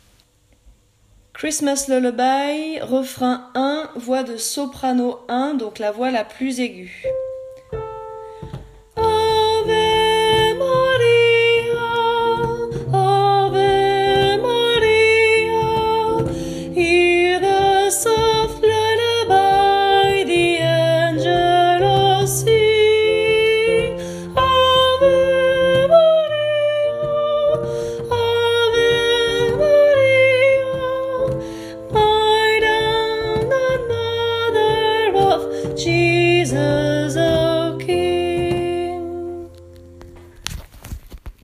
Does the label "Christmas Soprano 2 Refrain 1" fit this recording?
Yes